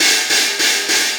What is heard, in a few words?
Cymbal Pattern; Sonic Foundry (Sony Creative Software) - Essential Percussion